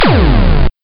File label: Rescue; Tempest 4000